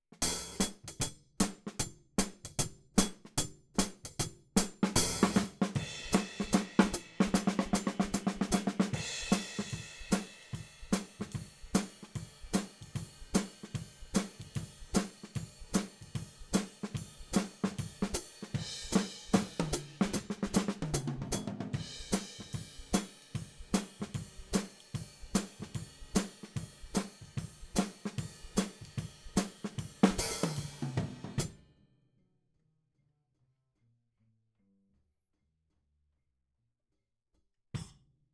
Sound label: Hat